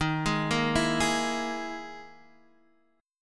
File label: E Major 7th Flat 5th